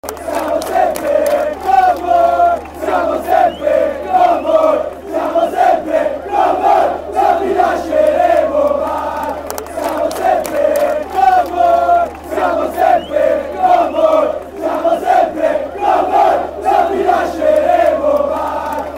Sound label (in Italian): Siamo Sempre Con Voi, cori curva nord